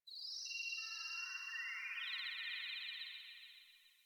Venus Landscape Distant Corpus Texture; Ambience; Warframe; Venus Open World

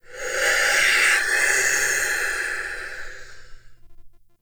Binaural Whispers; Ambience; Warframe; Shadowgrapher

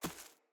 Battle Dog Walk Veg, Footsteps; Snc Battle Dog; Foley

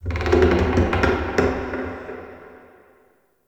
Wood Creak; Warframe; Shadowgrapher

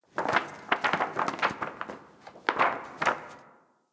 Station Misc, Ambience; Soul Plane; Orlick

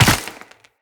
Land Ice, Footsteps; Characters; Tenno

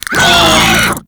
Desert Skate Vocal Pain; Warframe

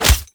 Unarmed Tempest Punch Impact Default; Weapon Impacts; Combat

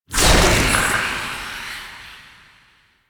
Tailor Shop Door Fade; Interior; Warframe; Nineteen Ninety Nine

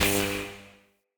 Ambient Tesla Rod Zap; Encounters; Ode Pollution Tower

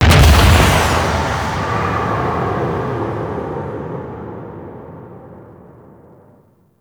Forest Capture Obj Ship Take Off; Warframe; Grineer Forest

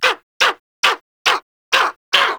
Bird Of Prey Vocal Idle Beg

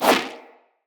Fire Arrow Whiz By

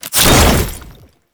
Ammo Cast Whoosh; Frumentarius

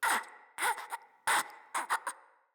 Infested Crawler Breath; Crawlers

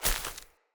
Grunt Step Veg, Footsteps; Enemy; Characters; Corrupted Sproutfolk

